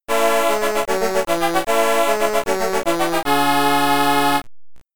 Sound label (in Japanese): 予選スタート・ファンファーレ